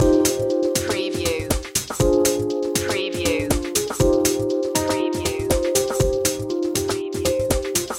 turn on loop

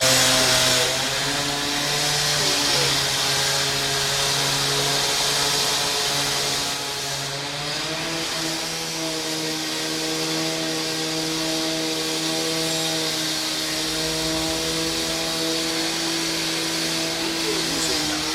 angle grinder

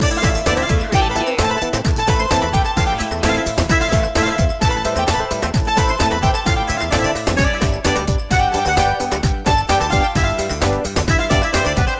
egyptian dance loop

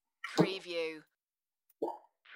Child gulping a drink; beanstalk audio

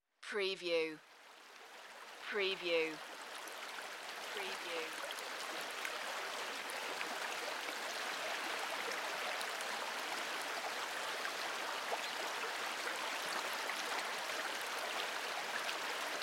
Welsh babbling brook; beanstalk audio

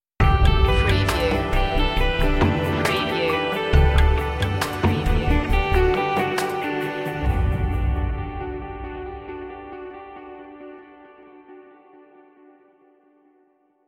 watercolours stinger

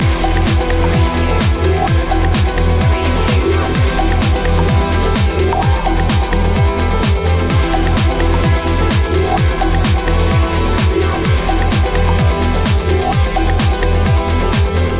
plurality move loop

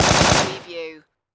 Machine Gun M4A2 Short Burst Close Perspective

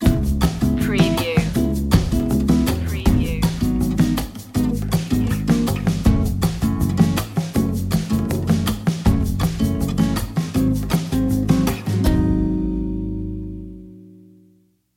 vibe stinger

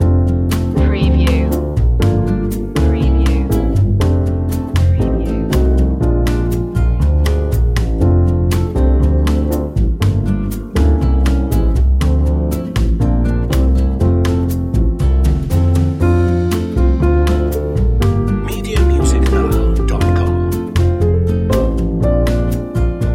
auld lang syne jazzy

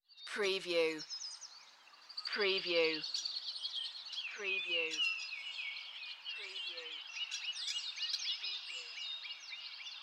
Nature Ambience Birds In a Forest

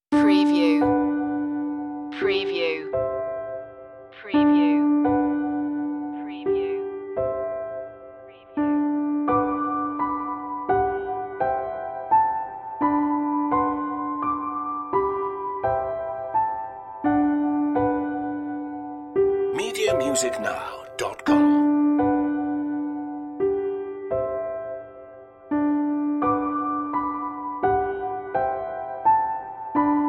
piano

